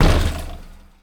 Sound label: Street Ball Glass Rim; SFX Kit; Street Basketball